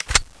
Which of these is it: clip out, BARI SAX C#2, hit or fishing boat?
clip out